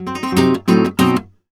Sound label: Flamenco Guitar Frase